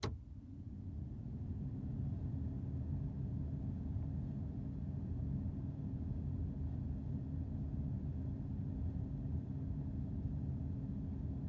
Vent Full Size Van Air Conditioner Vent First Notch; transportation